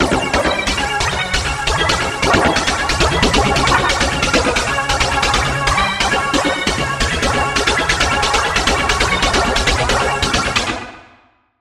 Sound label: Key; Donky Jungle; Routed Sound; Spire Expansion - Hyper Gamer Vol.1